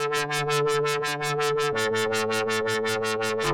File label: wooble